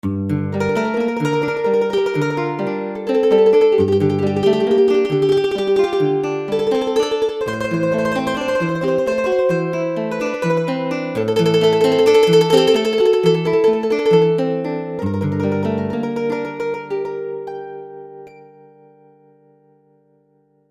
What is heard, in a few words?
Perlon Guitar, v-synth